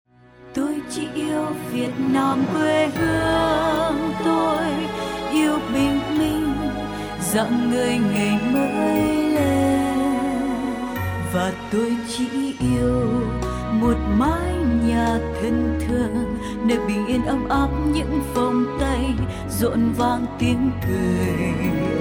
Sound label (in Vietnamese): nhac quang cao